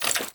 Footstep; Warframe; Dagath

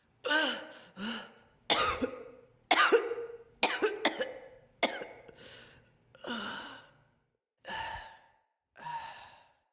Cough Fall Female, Battle; Envoy

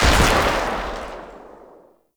Shot, Rifle; Warframe; Weapons; Thanotech; Trumna Prime

